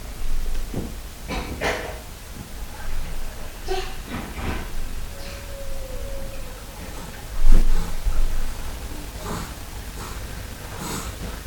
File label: whales